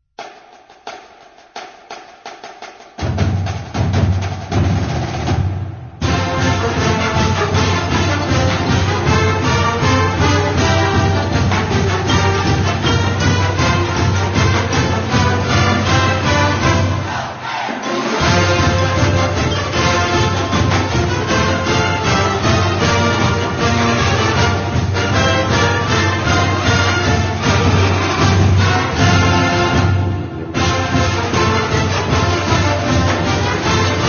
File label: Fight Song; Northern Colorado; UNC